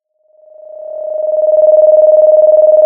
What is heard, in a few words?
pop, Sound of a phone ringing